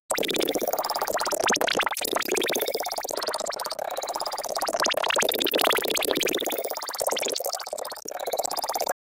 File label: sound which play when cha, chameleon